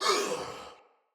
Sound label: Pain, Barks Battle Soldier; Sinecure Soldier